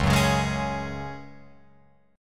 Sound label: C sharp Suspended 4th C sharp down Guitar Open F; strummed; JGuitar